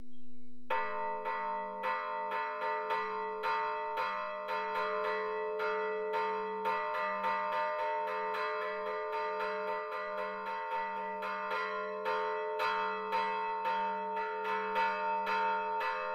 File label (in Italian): SUONATA, campane; Le campane del campanile di Santa Maria del Piano; il cicerone